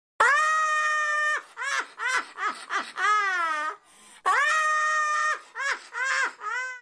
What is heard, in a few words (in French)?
Hahaha Rire